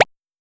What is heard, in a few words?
Classic Jump